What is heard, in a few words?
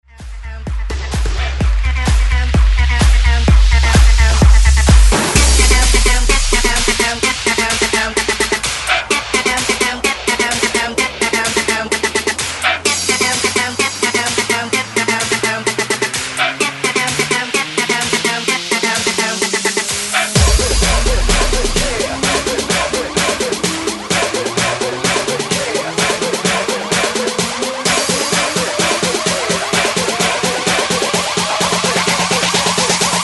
130 BREAKMIX; DJDOLLS